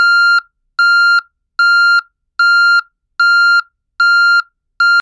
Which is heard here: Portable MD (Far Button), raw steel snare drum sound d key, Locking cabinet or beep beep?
beep beep